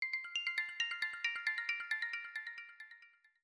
Ringtone Ducked Haptic, iOS Sounds; sashimi

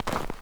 snow land; Golden Crayon Sounds

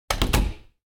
door close; Golden Crayon Sounds

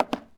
plywood step; Golden Crayon Sounds

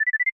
Alert Prominent; iOS Sounds

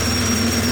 tank engine ext; Original TK Sounds

